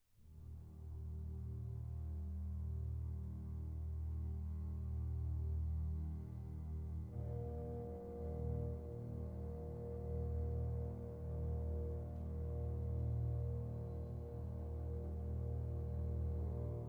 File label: nature